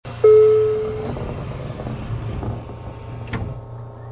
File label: door